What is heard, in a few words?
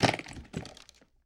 Suitcase Latch Open; Sony Pictures Sound Effects Series; Period Devices